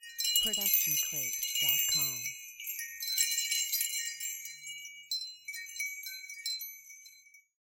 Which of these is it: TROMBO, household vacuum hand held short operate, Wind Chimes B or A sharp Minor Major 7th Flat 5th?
Wind Chimes B